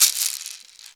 IN SHAKER; Ragga & Dub Reggae

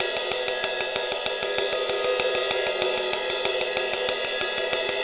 Ride Cymbal Pattern

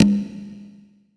Open Conga, percussion reverb; MARKYDRUMS

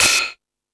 Fusion Stix, E-MU Pro-Cussion; drum machine

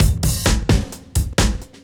Beat Style A 130, breaks; 130bpm

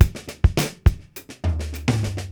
Beat Loops; Zero-G; Monster Beats

